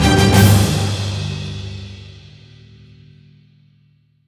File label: Random Wild Loop End; netent